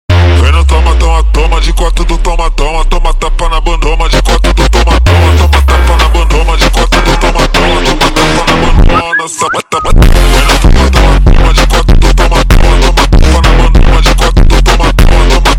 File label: Ultra Slowed